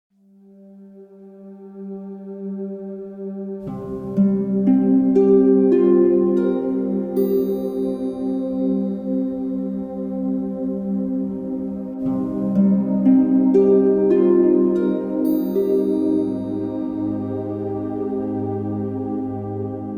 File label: Field BGM; Xenoblade Chronicles 3